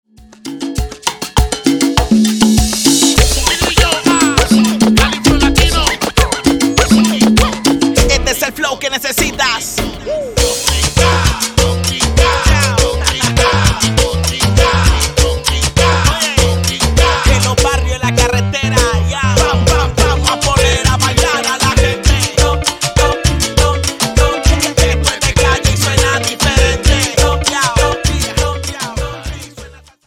Remix Intro 100 Bpm

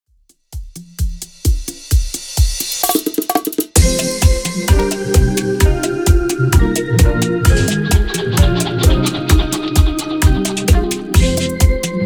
Remix Intro 130 Bpm; Prodeejay Remix

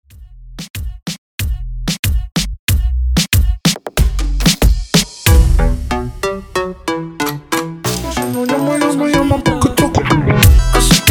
Como Oreo Intro Chorus 93 Bpm

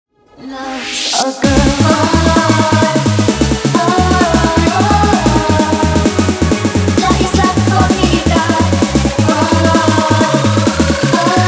guaracha remix 130 bpm

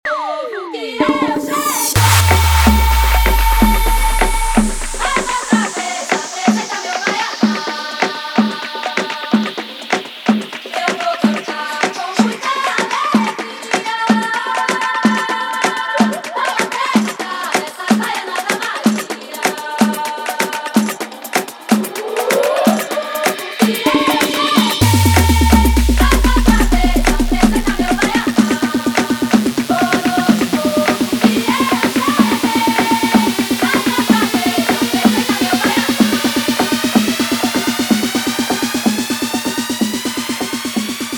intro outro 126 bpm

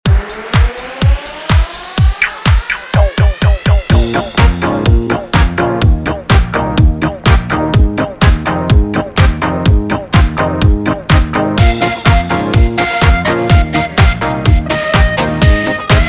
hans up directo 125bpm; Latin beats; Prodeejay Remix

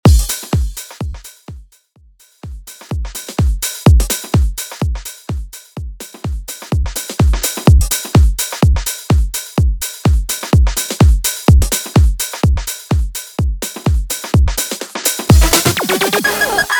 Intro Drop 126 bpm; Latin music remixer from Ecuador

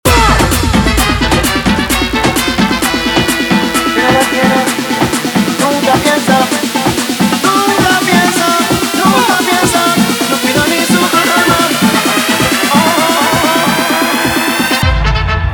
guaracha remix 130 bpm

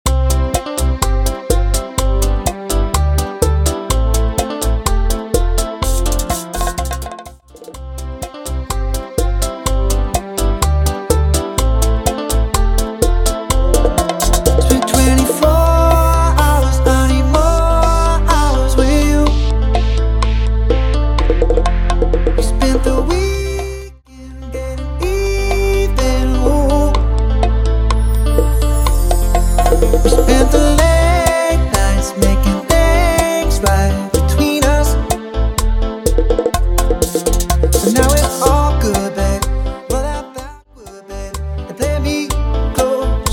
Bachata 125 BPM; Bachata Project; bachata bootleg remix

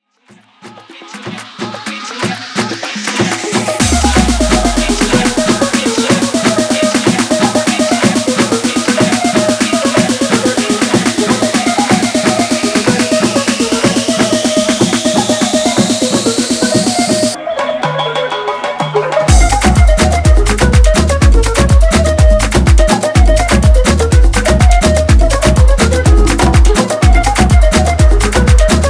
afro remix 124 bpm

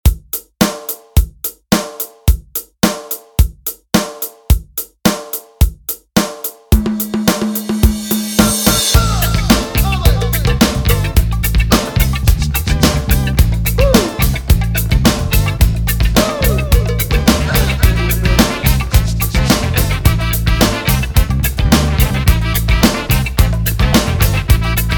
108bpm, Intro Outro

